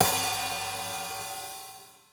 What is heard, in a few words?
hat, open hat